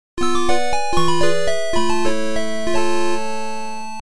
Nokia 8bit

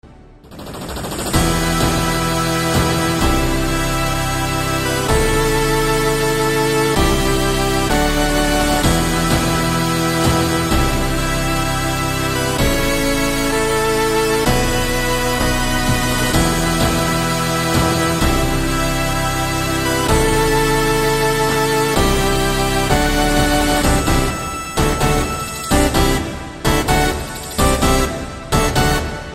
8 Bit; Avengers Endgame

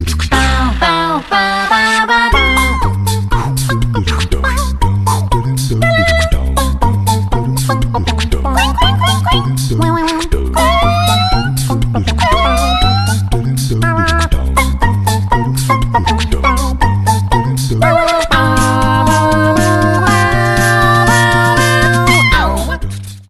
Acapella

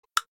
Iphone X Mensaje

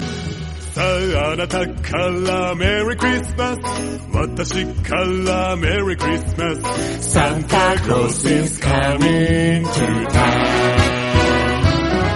Santa Claus singing

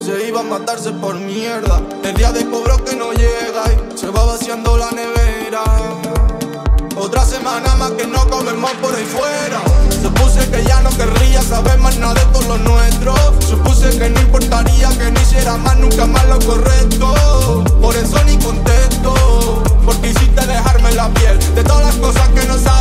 120bpm g minor

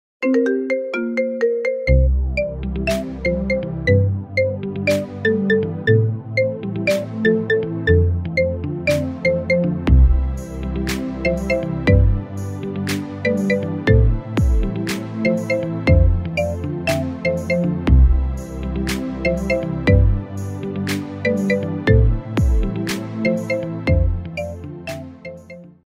Marimba

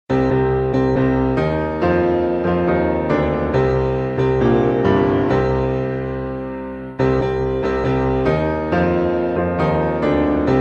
Piano

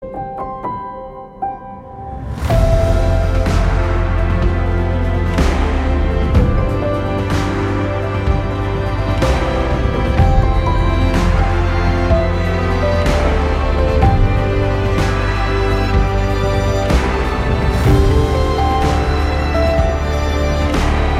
Samsung Galaxy S8 Plus, tono de llamada

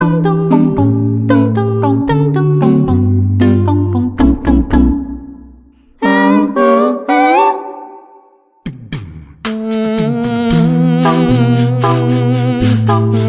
Wii Sound Effect Acapella; tono de llamada

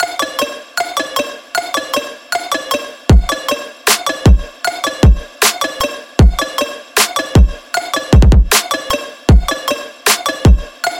Telephone Trap

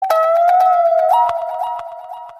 Sms Tone

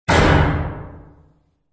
explosion bang

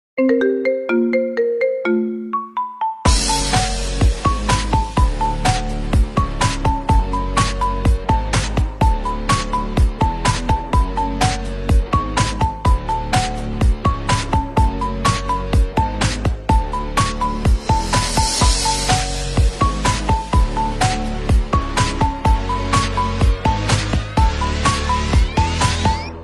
Marimba

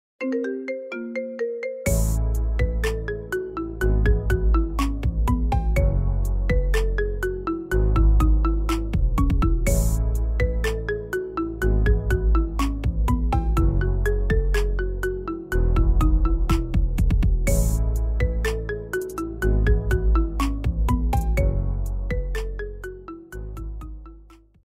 Marimba

